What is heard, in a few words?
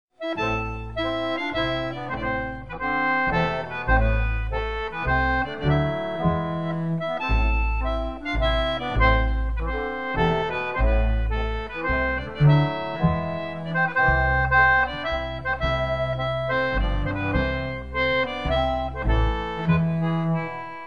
Polska, concertina; Polska E. Per Osa; from Ben Paley's Swedish fiddle music book; English concertina